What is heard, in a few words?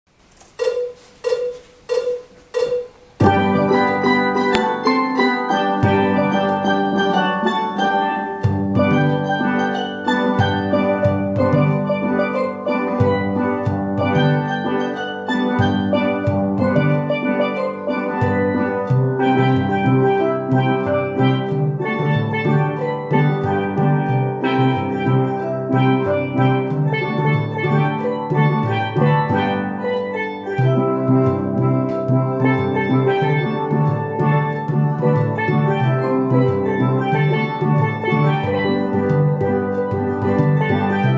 TUTTI